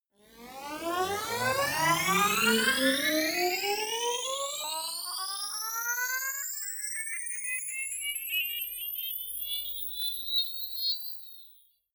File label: hover glitch, Risers; whooshes rises